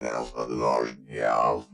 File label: robot; build mode